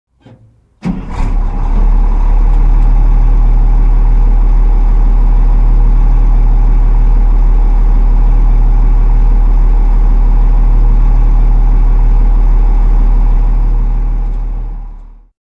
Bulldozer; Start, Idle; Bulldozer; Metallic Squeak, Start, Idle, Close Perspective.